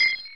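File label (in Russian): перкуссия одиночный компьютерный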